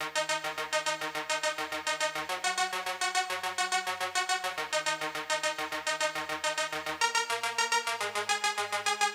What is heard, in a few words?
Wave Synth Arp Db; Pop Rock; Pro Tools Essential Demos; Digidesign; Essential Loop Demos; Demo Session - Pop Rock